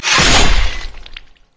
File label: yasuo base basic attack; LoL